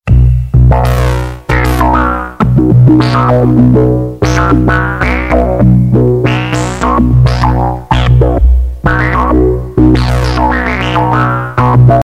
alternative jews harp; ochen strannaya muzyka pohozhaya vargana

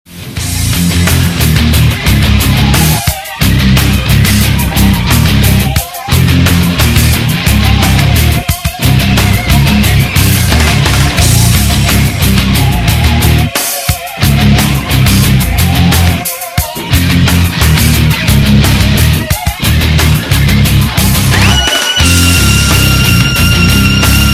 blues

